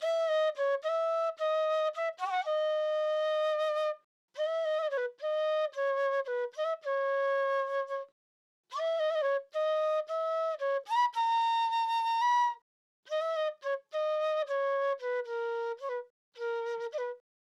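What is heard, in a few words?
Flute B major 110 BPM, Dancehall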